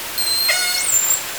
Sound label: call, Orca; SRKW; Alwin